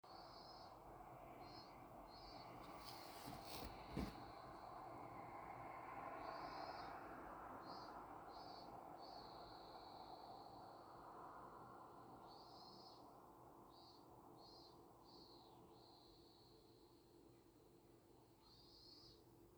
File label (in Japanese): 車とセミの鳴き声; 環境音素材